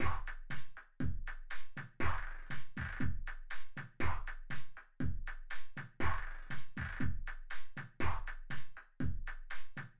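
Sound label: with drums synthetic convolutive 5cm; SASSEC07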